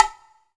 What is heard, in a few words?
Bongo C, Pecussion Hits; Zero-G Jungle Warfare 1, 2 & 3; 90 s Sample CDs